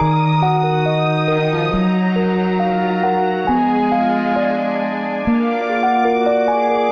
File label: strings, Sad